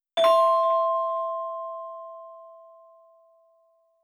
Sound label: notification mobile